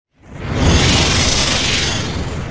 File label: chem missile; Earth and Beyond; EA Games